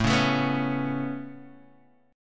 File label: A Major Add 9th A Guitar Open D, chord